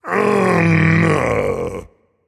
Ugh

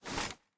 mvt armor; serqet; smite

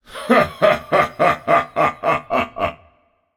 laugh; cernunnos

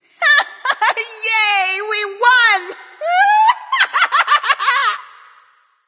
yay; smite; medusa

